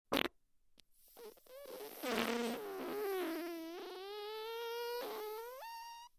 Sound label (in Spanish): Globillo